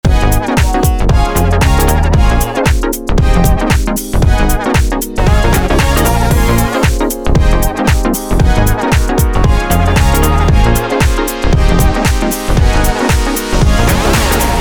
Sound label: Funk